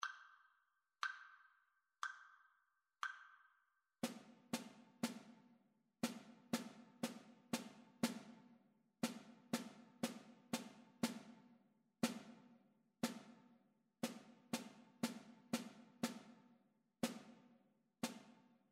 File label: rhythmic dictation